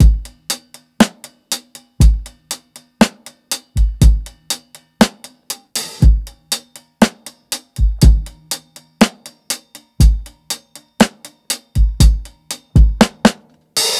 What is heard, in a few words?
120 HALF TIME, DRUMS LOOPS; BASEMENT DRUMS VOL 2